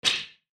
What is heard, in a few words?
creature misc